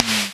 Snare, Dubstep One-Shot Drums

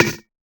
Snare, Dubstep One-Shot Drums

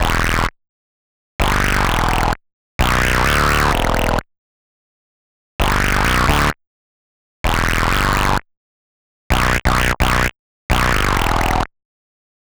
129 Em Bass, Complex Electro Synths; Prime Loops; Arena

